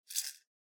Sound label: Spice Mill Salt Shake